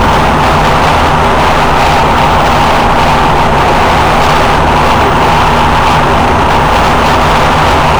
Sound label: F-15 Eagle Roar; DCS